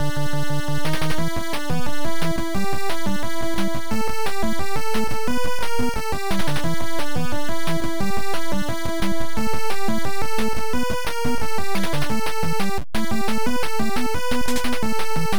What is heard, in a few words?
Battle; Phantom Fighter; nes